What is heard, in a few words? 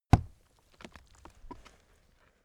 axe hit